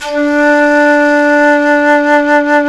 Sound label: Jazz Flute